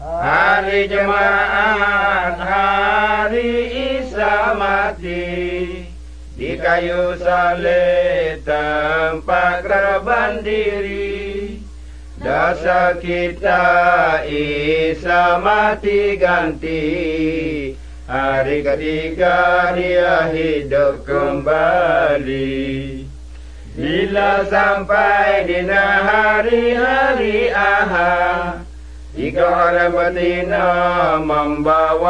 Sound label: songs malay pattani